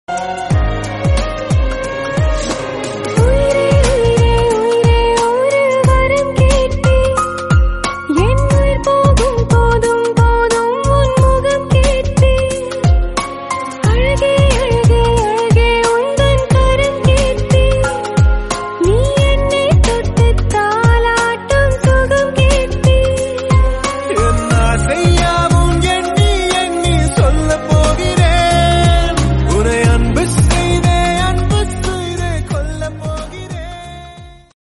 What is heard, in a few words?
tamil love sad ringtone